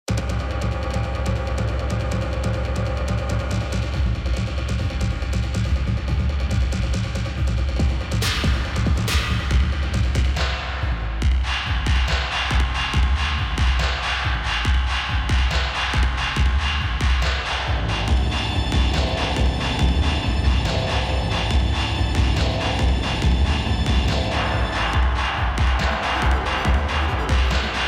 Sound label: impulse engine